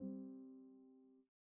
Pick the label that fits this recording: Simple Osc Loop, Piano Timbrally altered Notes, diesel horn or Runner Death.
Piano Timbrally altered Notes